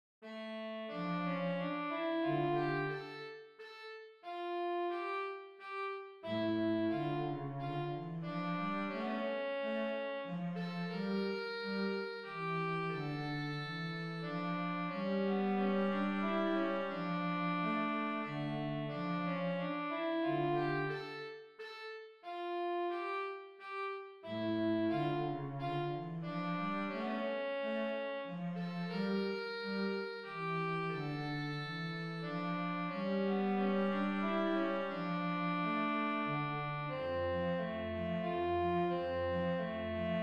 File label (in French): menuet mineur; lully jean baptiste